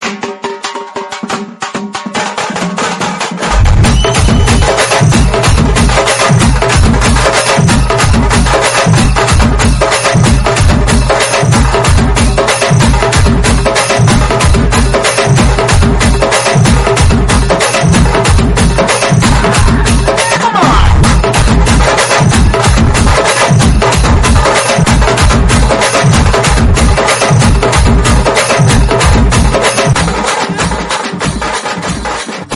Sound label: Best instrumental music